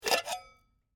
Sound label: Teapot Lid Open, Household, Teapot, Lid, Open, Full, Metal; Kitchen Sound Effects; SoundEffects-BlastWave